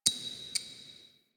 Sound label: Clock Tick; Warframe; Cephalon Weave